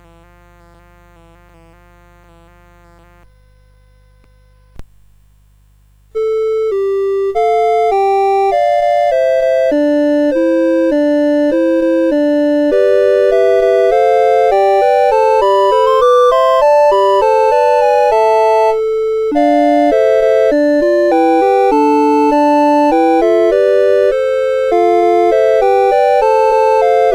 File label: CSG8580; Tappster Dryngker; CSG8580R5